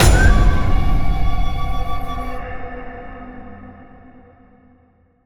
alert trigger

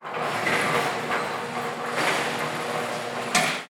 Gates; Commune

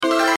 se light; End of Aspiration; android

